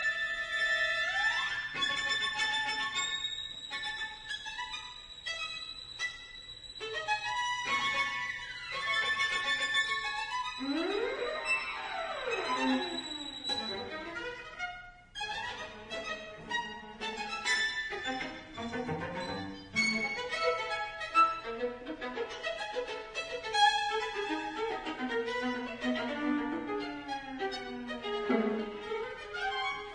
String Quartet, Allegro possibile; Husa

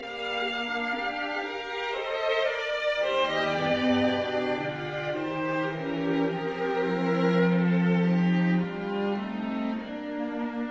Haydn String Quartet in E flat major, Andante; Op 64 No 6; Hob III64

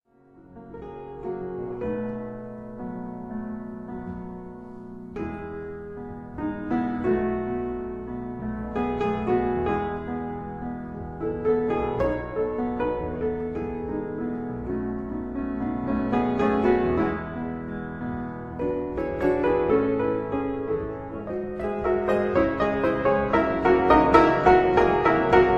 Etudes for piano in E major

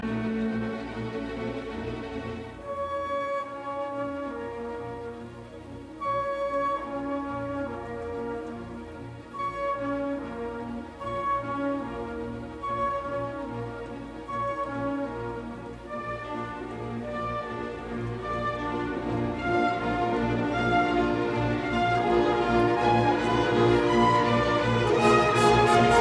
Symphony in E flat major, Finale Bewegt; Symphony No 4; WAB 104; Romantic; 1881 version ed R Haas

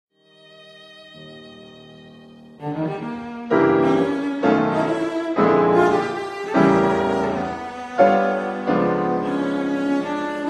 Trio, Op. 112 Rondino; Creston

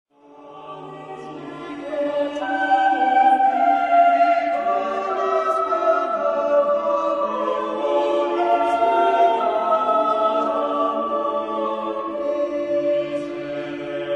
Mass for 4 voices Agnus Dei; Certon; 'Sur le Pont D'Avignon'

